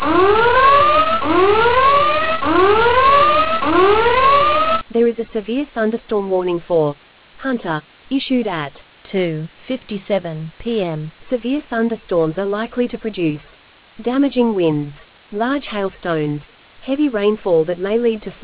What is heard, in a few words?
storm audio